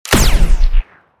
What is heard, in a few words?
Scifi Gun Blast